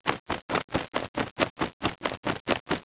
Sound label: bat flying